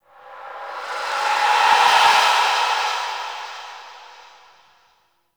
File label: Zariman Whisper Air Draft; Warframe; The New War; Ambience